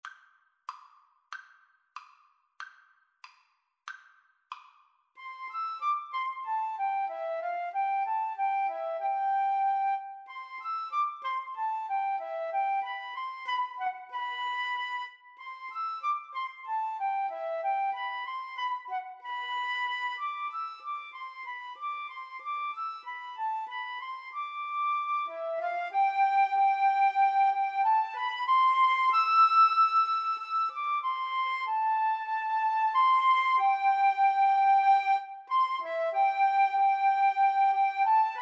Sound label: flute duet